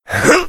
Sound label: heavy meleeing; tf; duke